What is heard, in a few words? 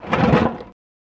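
Motorcycle Dump Over, metal impact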